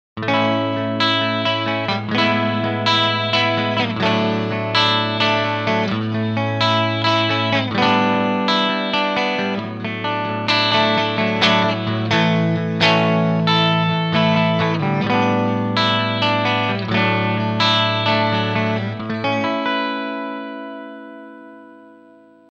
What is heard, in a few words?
MAXON CS550 Bypass